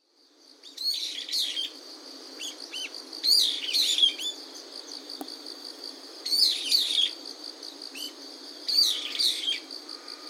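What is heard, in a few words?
Brown-eared Bulbul, Hino; hiyodori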